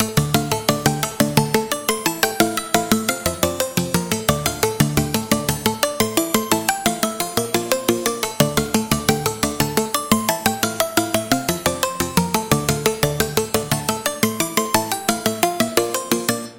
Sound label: Key